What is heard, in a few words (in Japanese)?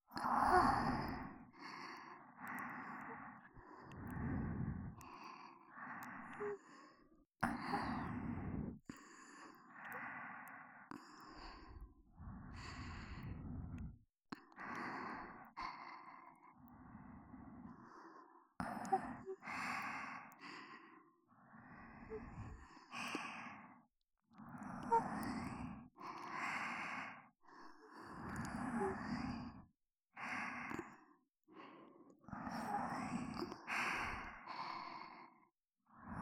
『吐息（反転）』